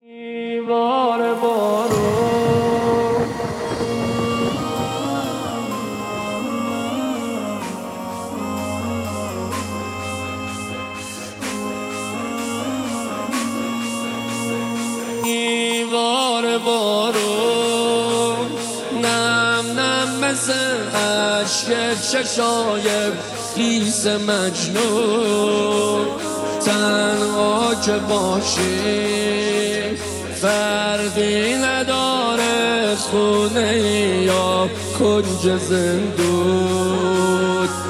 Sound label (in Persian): مداحی احساسی